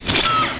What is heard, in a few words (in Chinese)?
机械; 音效